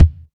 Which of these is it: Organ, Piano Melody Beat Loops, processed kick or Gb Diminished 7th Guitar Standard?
processed kick